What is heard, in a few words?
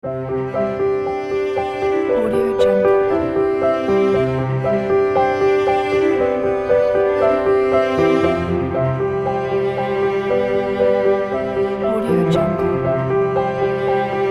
piano and cello emotional storyteller